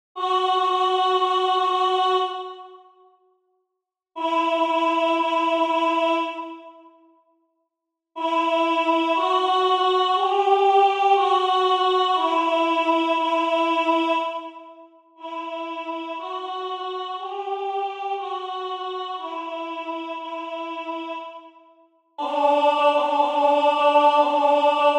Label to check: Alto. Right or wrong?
Right